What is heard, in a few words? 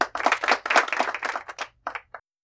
clapping; tf; noisemakers